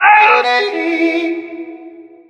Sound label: climber; Left 4 Dead 2